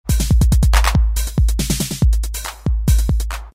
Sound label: frutty loop; wap-terminal; ring